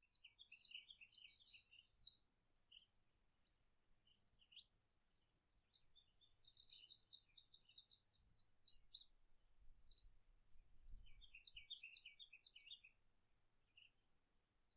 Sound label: Birdsong